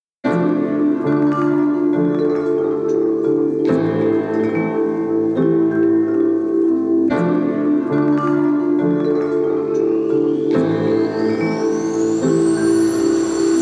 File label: dubstep remix